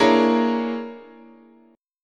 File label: Bb 7th Sharp 9th Flat 5th, guitar chord